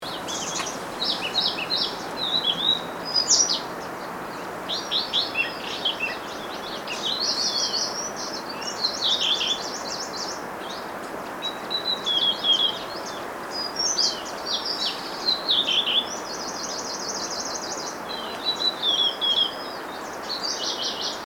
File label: Firethroat Wolong Sichuan; China 2011